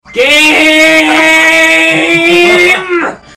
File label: gaaaaaaaaaaaaame